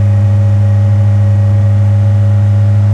Jeep Wrangler Loop; Trucks

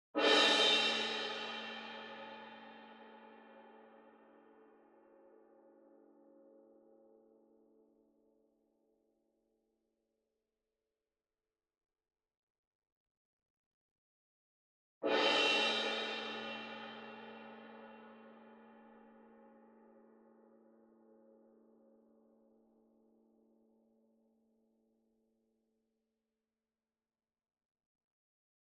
CYMBAL Crash Hit Soft Beater Far; boom library; Cinematic Strikes Construction Kit

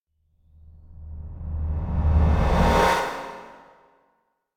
Metallic Whoosh - Appear Vanish; Hollywood Edge; sound designer's toolkit v2

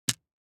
DICE on Felt, Drop, Standard, Six Dice; Samples; ArticulatedSounds; DICE Sfx Party (Extended)

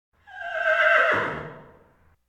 Animal effects snorts grunts farm ambience

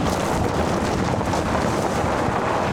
Auto Tires Gravel Loop; Automobiles

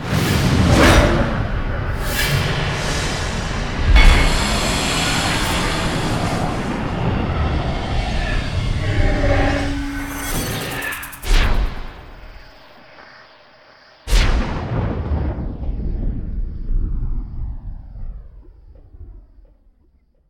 death; firefight

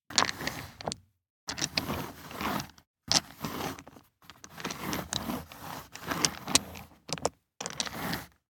DICE on Neoprene, Slide, Standard, Six Dice; Samples; ArticulatedSounds; DICE Sfx Party (Extended)

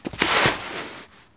Baseball Dive Dirt

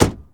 Truck Explorer Door Close; Trucks

